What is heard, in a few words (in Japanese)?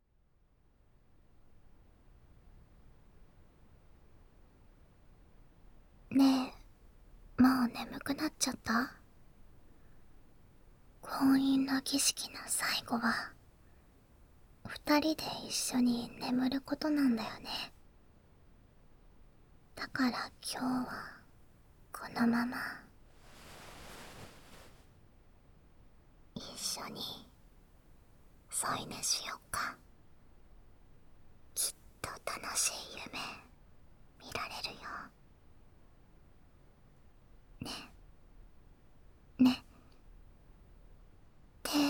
囁き音ASMR+寝息入眠トラック; 夢見の儀式; SEあり